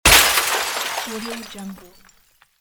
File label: window break